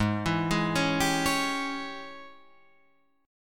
G sharp Major 7th Suspended 4th Sharp 5th, Guitar, Drop D